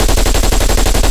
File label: loop sgmt origin, guns tanks